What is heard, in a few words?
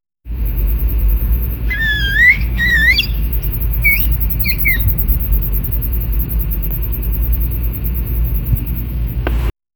happy rat is squeaking